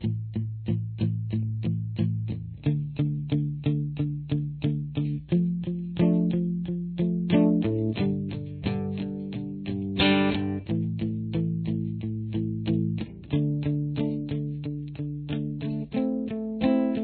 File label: verse acoustic, guitar